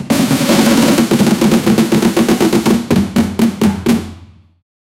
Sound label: marching band equpment